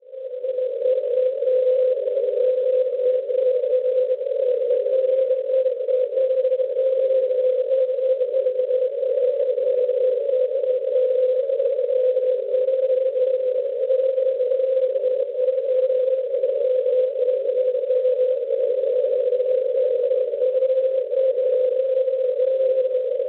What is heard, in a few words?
NDB Namsos 329 kHz; NMS; Norvegia; Schwarzsee